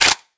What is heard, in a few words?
chg hnd fwd; cstrike; valorant; reaver vandal